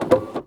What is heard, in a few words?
printer; Golden Crayon Sounds